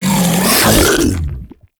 Grendel Bowl Devour; Warframe

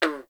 Forest Frog Close; Warframe; Grineer

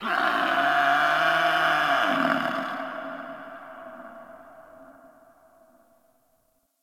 distant creature; Ambience; Warframe; Eidolon Plains

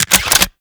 Xbow Reload Clip Out; Warframe; Tenno; Primary Xbow

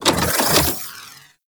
Umbilical Machine Arms Engage; Hub; Liset Machines

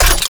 Rocket Launcher Fully Charged; UT Weapons

